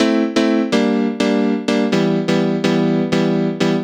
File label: DEEPHOUSE PIANO 125 A; Keys